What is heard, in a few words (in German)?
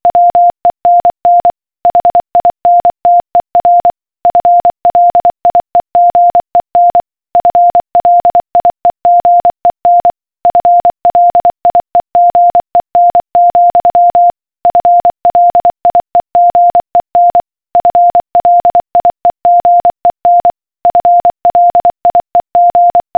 Fliegen Morsecode